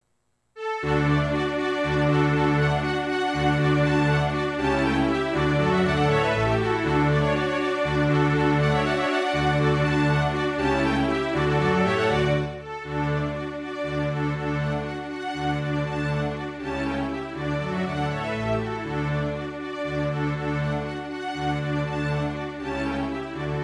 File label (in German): hornkonzert orchester; mozart; トール音楽工房